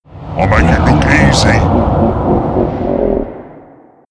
win; freak fortress 2; nightmare sniper

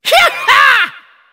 laugh; hametsu

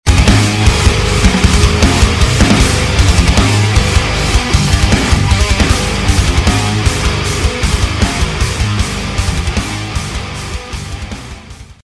outtro music; the myst scout; tie

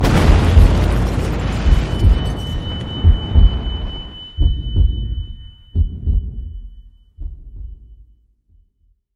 impact nuke

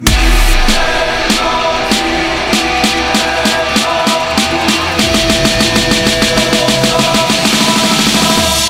intro music

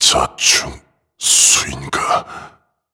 stabbed; blade lineage

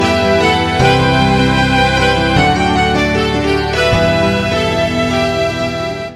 outtro music; freak fortress 2

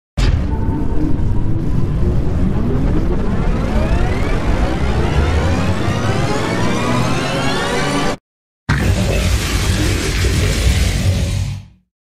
takeoff; victria; hercules

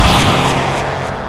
beam shoot loop; freak fortress 2; sans

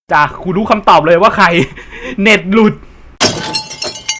lose

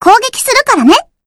hit

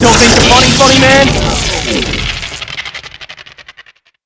rage; ff2; reaperz

